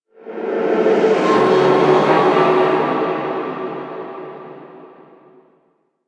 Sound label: Shrine Ghost Cry D; Warframe; Revenant